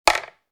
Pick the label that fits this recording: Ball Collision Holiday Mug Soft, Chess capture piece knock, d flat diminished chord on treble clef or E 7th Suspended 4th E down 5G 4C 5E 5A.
Chess capture piece knock